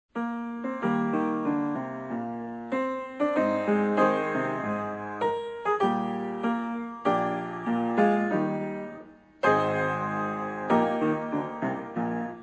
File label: piano